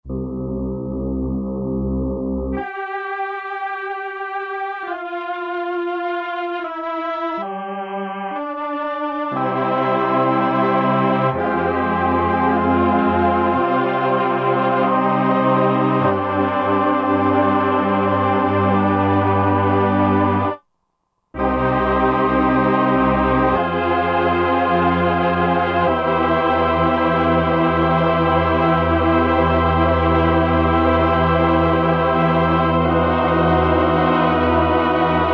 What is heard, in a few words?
Dry Str Choir; '60s & '70s; Roland SR-JV80-08